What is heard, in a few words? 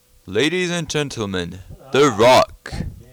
rock